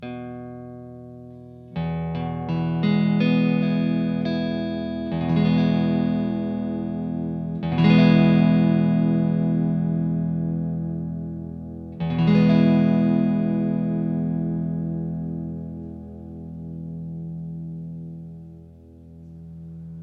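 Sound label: Vox AC15 channel II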